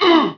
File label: hurt